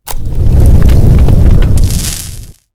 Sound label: barnburner